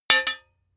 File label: hammer hit metal clank